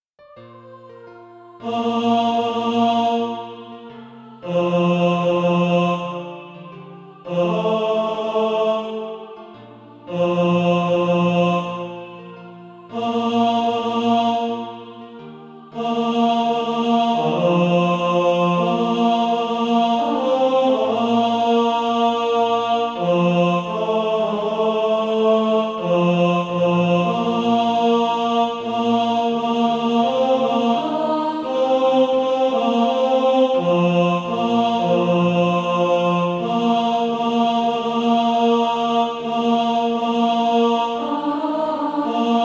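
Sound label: ten, Practise Music; Calvary Darwood Sheet Music (SATB)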